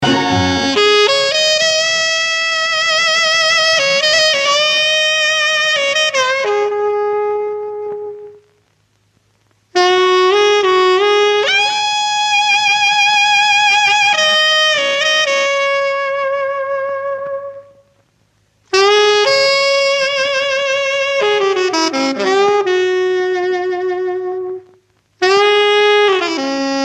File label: saxophone refrain